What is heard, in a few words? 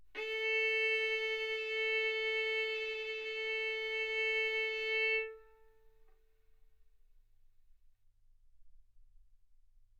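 Violin arco mf A string A4 B4 stereo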